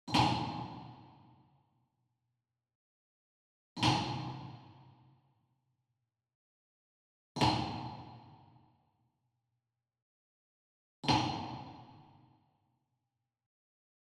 Wood Percussion Wood Block Traditional Small Resonant Flam Far; boom library; Cinematic Strikes Construction Kit